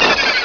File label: ice, combat; blobAndConquer